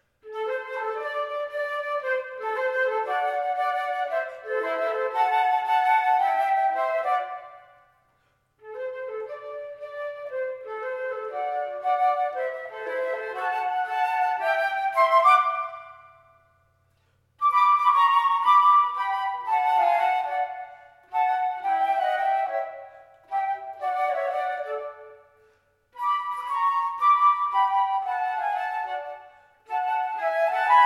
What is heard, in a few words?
wombat wobble flute